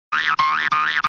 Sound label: Bouncing Ball